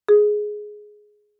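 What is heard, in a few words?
Marimba yarn fortissimo Ab4; MIS Pitches - 2014; Percussion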